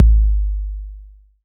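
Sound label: bass drum 808; Best Service Gigapack 2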